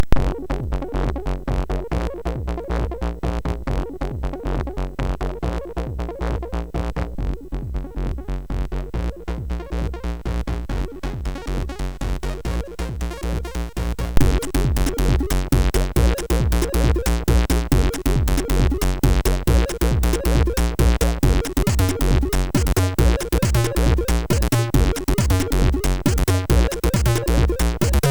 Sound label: House, sid MOS6581R3; hvsc